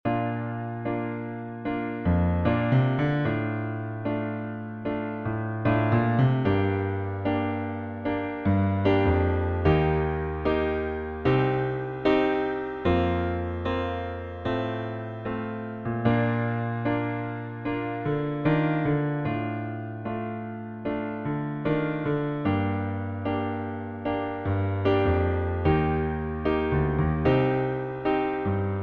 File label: b flat piano